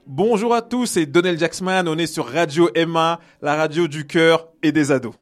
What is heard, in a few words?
jingle; donel jacksman